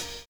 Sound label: HH OPEN, Drums; USB Soundscan vol.11 - Drums Dance & Groove; AKAI